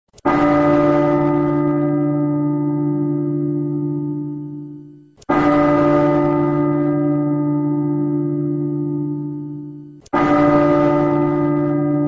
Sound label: Bell